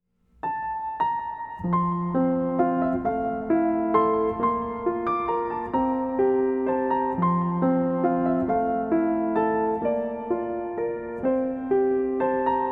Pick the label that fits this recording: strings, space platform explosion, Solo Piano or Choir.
Solo Piano